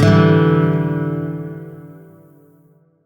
Shawzin Maj Chord E; Warframe; Lotus Shawzin

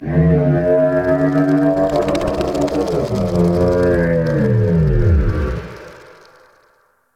Venus Landscape Mushroom Adult; Ambience; Warframe; Venus Open World

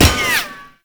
Tank Shield Impact; Warframe; Lasrian Tank

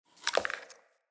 Plant Acorn; Cinematics; Bromius; Bromius Quest Acorn

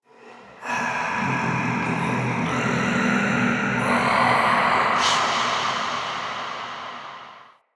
Day Texture, Ambience; Glades Of Andurin

